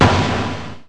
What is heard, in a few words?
bang; Select Soft Publishing Stock Clips Multimedia Clips; buttons